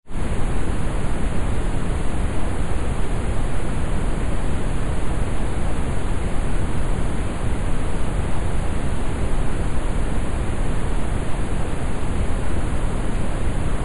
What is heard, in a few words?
Ocean